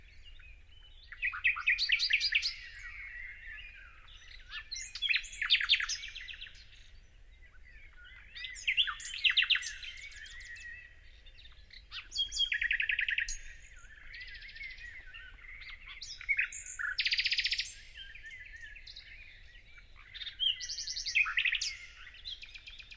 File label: luscinia megarhynchos